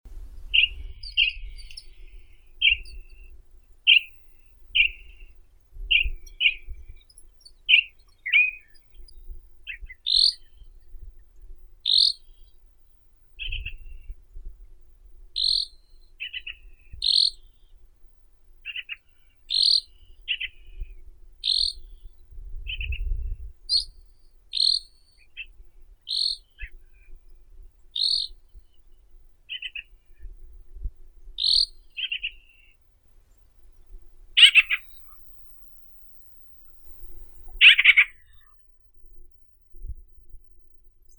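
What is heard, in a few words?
grosbeak, Mycerobas carnipes; dubonos archovii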